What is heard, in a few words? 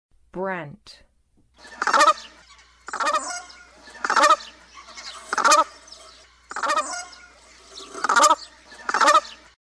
Brant, Songs of Birds of North America; Western USA; Western USA Songbirds